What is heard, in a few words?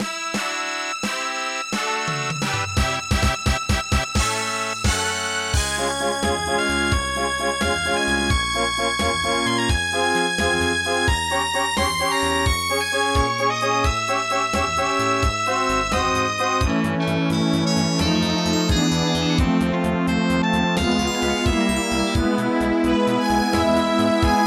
Start; Sea Legends; MS-DOS